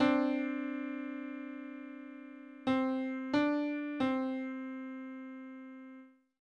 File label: Major second on C